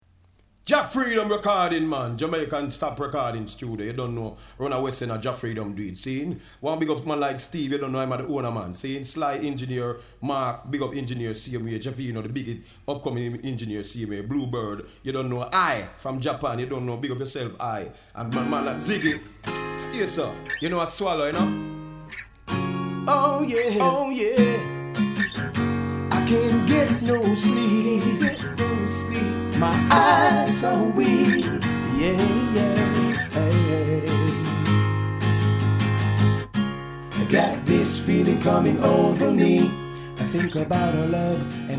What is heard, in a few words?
dub on guitar